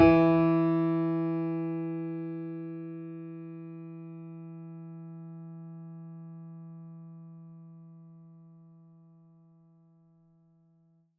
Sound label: mini grand; bananenkeyboard